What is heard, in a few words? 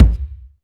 NATIVE, KICKS